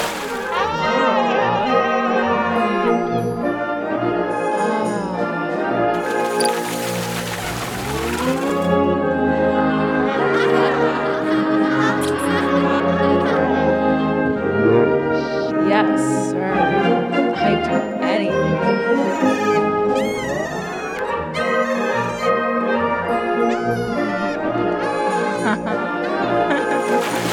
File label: Waltz; Pervert; puss soundtrack